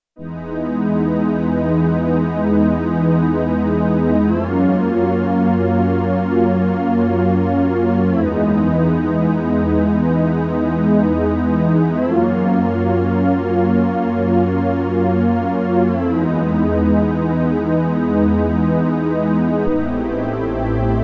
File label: Pulse Pad, Roland JD-800; Factory Patches